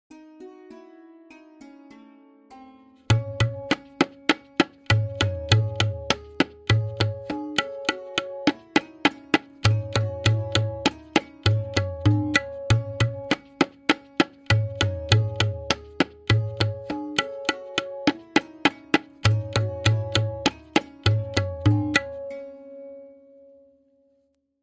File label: Variation 4-Played with Lehra, Benares Kaida 1, Tintal; Benares Kayda 1